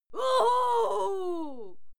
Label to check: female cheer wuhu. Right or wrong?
Right